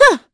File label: Vox Attack; Valance; kingsraid